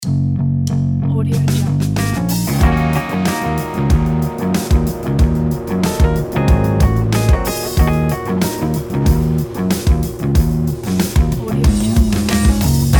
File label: funk rock ident